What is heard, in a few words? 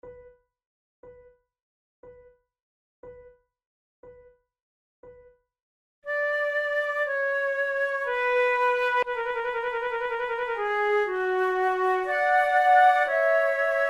sonate en trio, lentement, flute